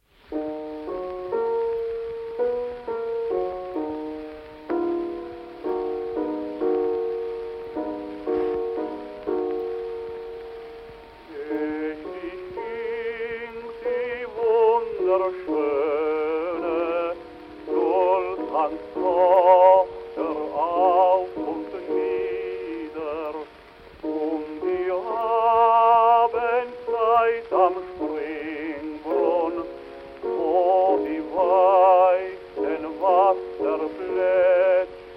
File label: Gramophone